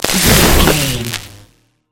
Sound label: electric surge blast